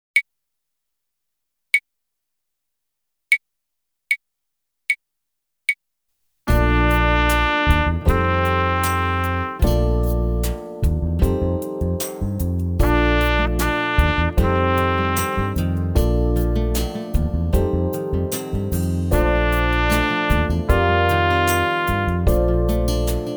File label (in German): Echo Ubung